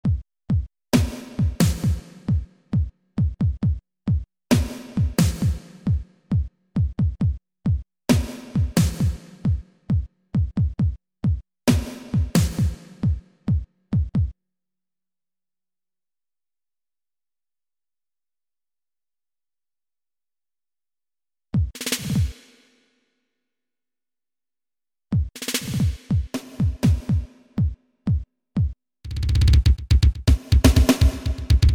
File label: bass roller